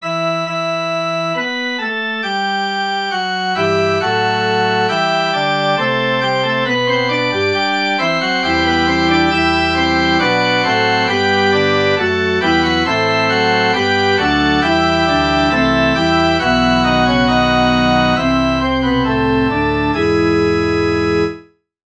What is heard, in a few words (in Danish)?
forspil; ddk 178 guds menighed syng